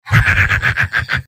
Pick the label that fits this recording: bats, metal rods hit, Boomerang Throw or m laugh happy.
m laugh happy